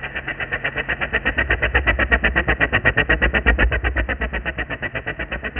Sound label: Helicopter; World Factbook 1995